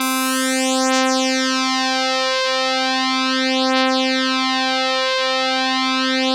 ODYSSEY LEAD C4, Vintage Keyboards; Big Fish Audio - First Call Vintage Keyboards

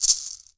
PUILI RATT-L, HAWAII PERC; AKAI S6000 CD-ROM; WorldSounds

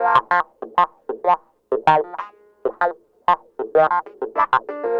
Clavinet, 70s Analog Funk; Sonic Foundry (Sony Creative Software); What it Is!

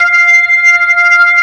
ORG VK, B-3 ORGANS Vinta Keys B3

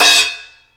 Choke Crash One-Shot, cymbal; KJ Sawka; Drum n Bass & Beyond; Drum Tool Box

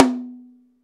TOM, Drum Dry; East Collexion; Drum 1

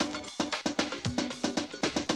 Classic Drum Loops

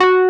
WURLITZER; E-MU Formula 4000 Series Vol. 3; Analog Odyssey

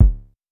Polite kicks C#1, Short; LIMAT Div Dept; Drums

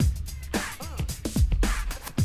Classic DrumLoops, 110

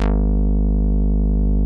TAURUS A2, synthesizer

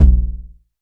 TOMS 909; Wizoo - Platinum 24 Electronic; CHR TOMS 909

